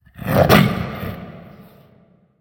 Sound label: tiger Roar; temple of nudges